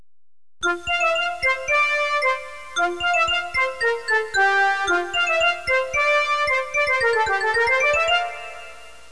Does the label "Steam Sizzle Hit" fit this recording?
No